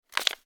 paper collect; WoWInterface; DialogueUI

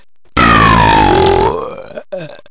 burp; WeakAuras; PowerAurasMedia

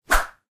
whip; WoWInterface; Details

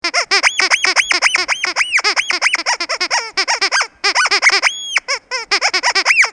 Squeaky pig; WeakAuras; PowerAurasMedia

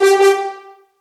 Truck; WoWInterface; Details

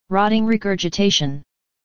breath; WoWInterface; BigWigs Voice

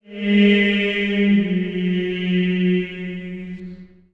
chant; WeakAuras; PowerAurasMedia

